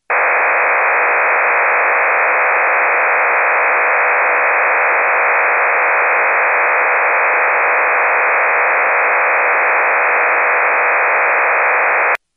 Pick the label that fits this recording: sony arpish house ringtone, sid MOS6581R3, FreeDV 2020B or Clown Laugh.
FreeDV 2020B